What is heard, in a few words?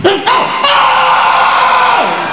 ohhh